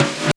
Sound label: REV TOM, ST.REV.TOMS; Back In Time Records; Big Bang